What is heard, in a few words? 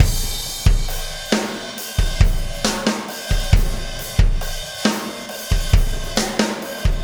Impact Crater Beat, Processed Drumkits